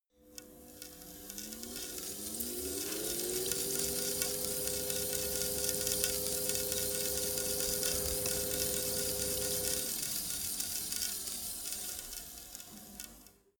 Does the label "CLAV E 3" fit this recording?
No